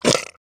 mud

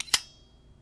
Spring Loaded Blade; bb - Smol Mechanisms

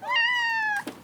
Cat Meows - Food Time; Micro Pack

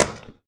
shot; bang sfx

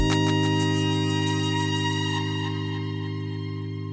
Trumpet Pad; Pads; BB 2HTC Samples Vol 4 Addendum

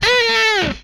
Death, Vocal

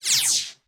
sci-fi sfx

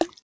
splash; water splash slime sfx

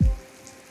Rainy Kick, Drums; BB 2HTC Samples Vol 4

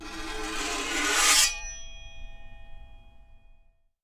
Scrape, Japanese Pull Saw

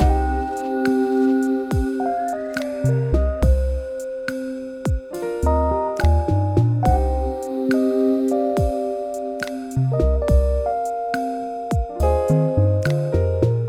Chill Rhodes; BB 2HTC Samples Vol 4

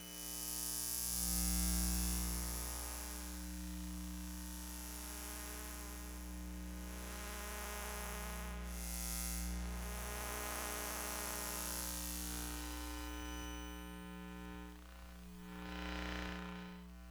EM Room Noise, electromagnetic sounds; Micro Pack; NazdyNate

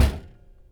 Metal Thunk; BB Retail Therapy Sample Pack

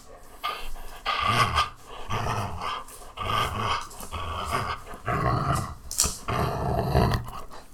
anger dog

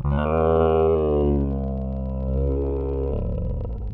Voice, beast or animal

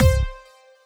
Big Blip; Weird

